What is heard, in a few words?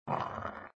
pour build topping; papa s freezeria gamerip